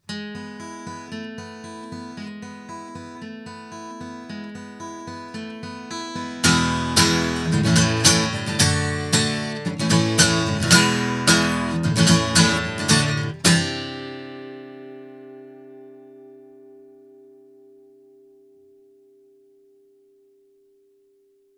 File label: Shure Beta58A FAKE guitar